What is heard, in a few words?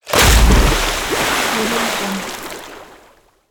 large water splash